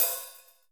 CR80 HH1OP2, BABY KITS; Crypton - DrumBase5000

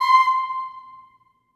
STACCATO C6, Symphony of Voices; Spectrasonics

